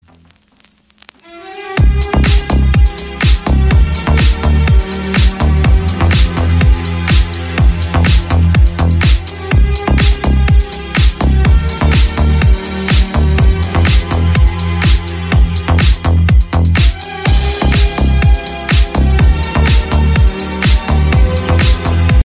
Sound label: classical iphone 8 ringtone